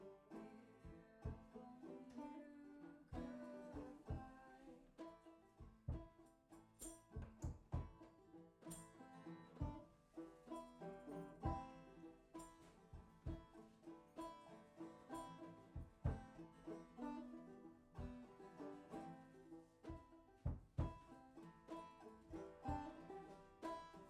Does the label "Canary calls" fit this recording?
No